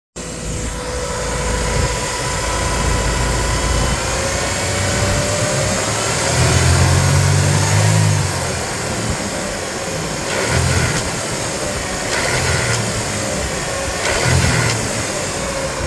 sound c220

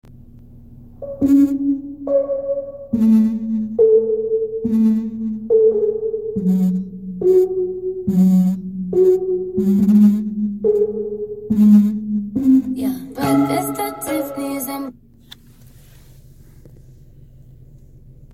stereo speaker rattle, c-class w205; stereo issues

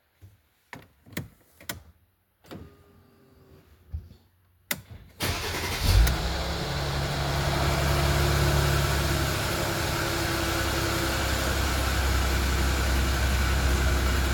cold start noise e400, e class coupe c207

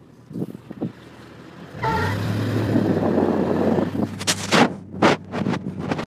big power loss chirp squeal noise; w211 amg

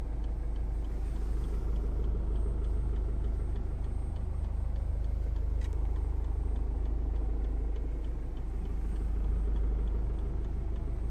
high pitch noise w213 whine; e-class

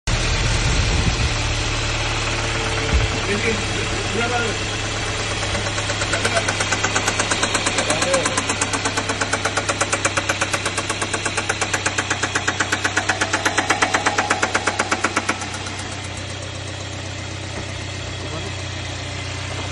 w205 c180 engine knocking noise; c-class w205

